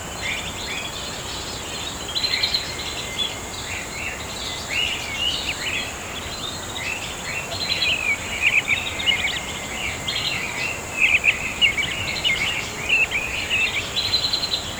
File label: Birds